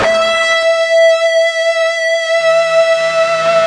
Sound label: fuzz E4